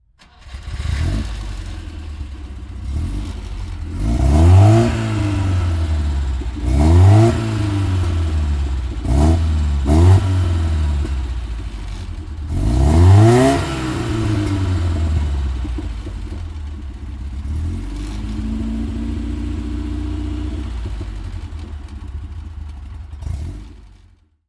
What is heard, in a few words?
Alfa Romeo 2000 Spider